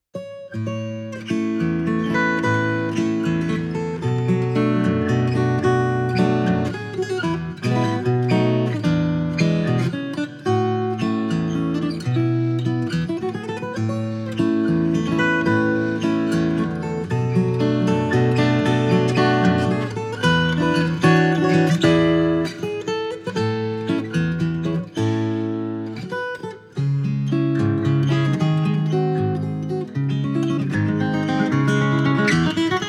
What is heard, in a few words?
Waltz